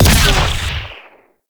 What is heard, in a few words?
Ice Sniper Fire; Warframe; Railjack; RailJack Weapons